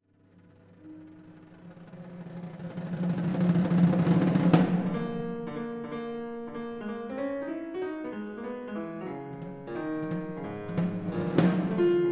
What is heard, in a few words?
Sonata For Two Pianos And Percussion; Bartok; First Movement